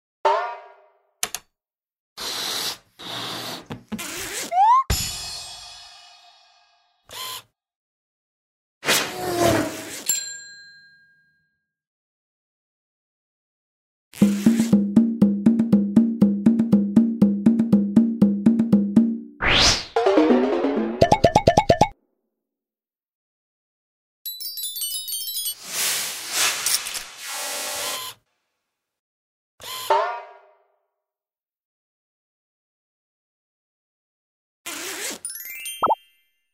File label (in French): ANIMATION